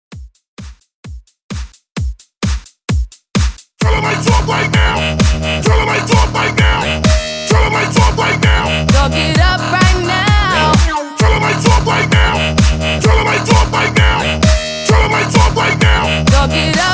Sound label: LEADER MIX 130 BPM